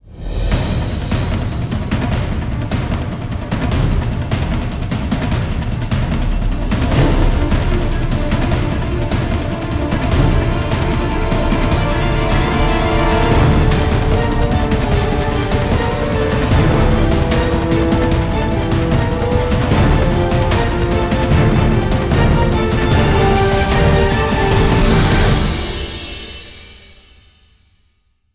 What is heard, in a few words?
Tower Scene Orch; homefront gamerip 2011